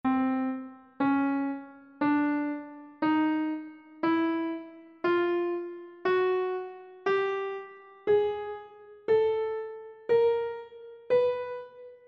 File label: c chromatic scale; treble clef; music